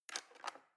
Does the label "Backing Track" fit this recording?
No